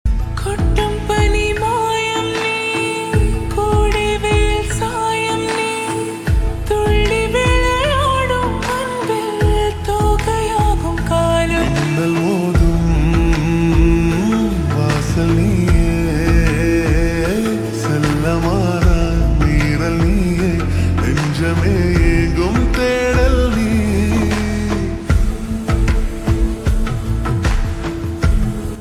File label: Ringtone Slow Reverb; tamil; amaran